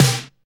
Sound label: clear snare one shot c sharp key